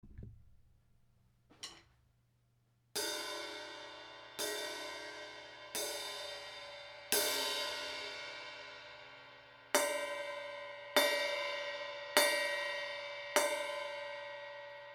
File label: Crash; Amedia; Kommagene SB